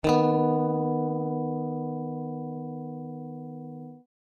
string a tone dis38

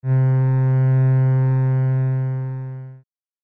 cello gs2

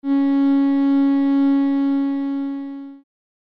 cello A3

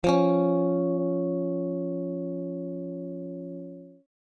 tones string a tone f38

